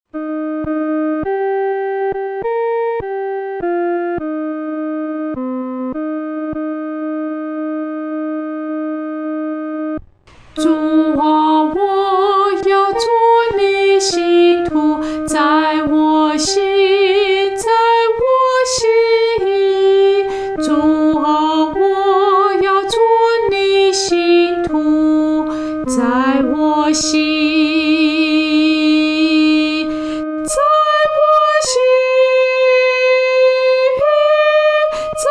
独唱 第一声

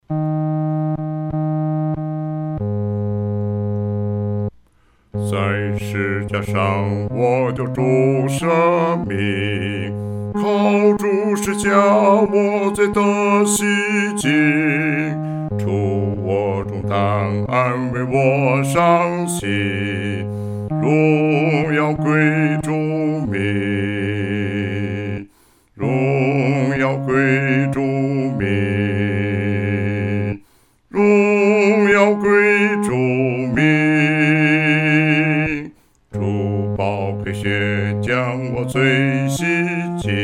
独唱 第四声; 荣耀归主名

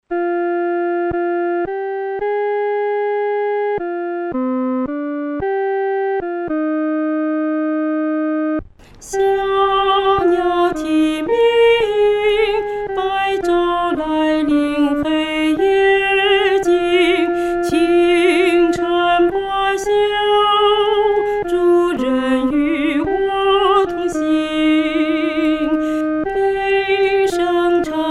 独唱 第一声

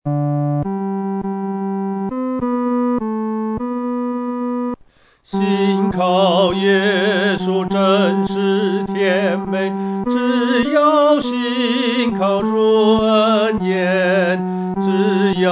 独唱 第三声; 信靠耶稣真是甜美

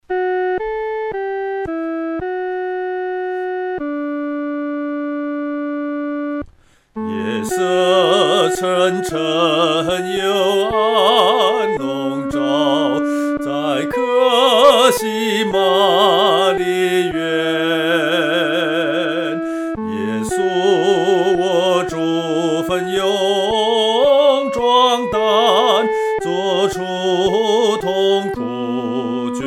独唱 第一声; 到客西马尼

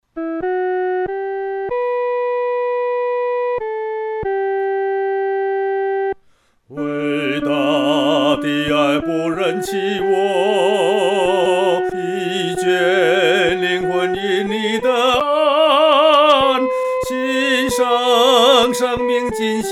独唱 第一声

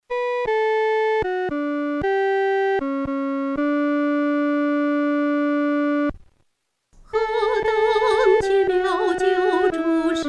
独唱 第一声

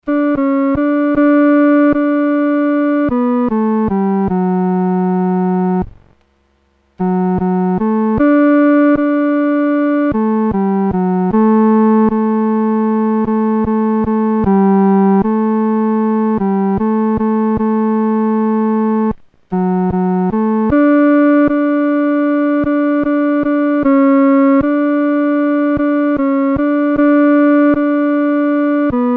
独奏 第三声

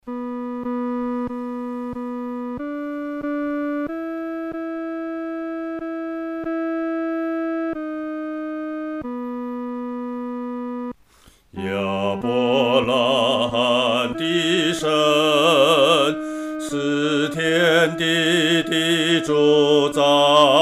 独唱（第二声）; 亚伯拉罕的神

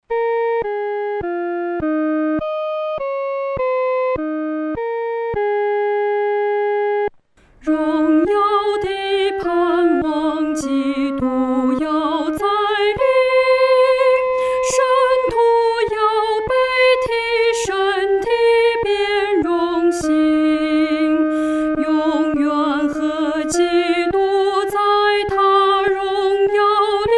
独唱 第一声

